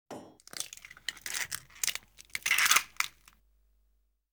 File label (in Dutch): Brekend Ei; KMP Film Video Sound Effects